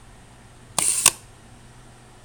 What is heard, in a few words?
Widelux; wider horizons making panoramic images